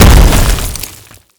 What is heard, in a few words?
Projectile Sounds; Red Orchestra Ostfront 41-45